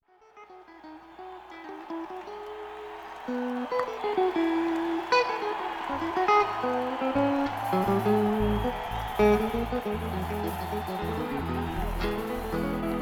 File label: Tuning